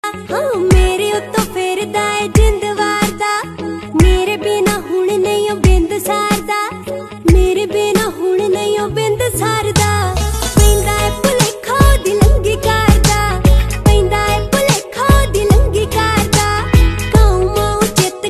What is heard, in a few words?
punjabi song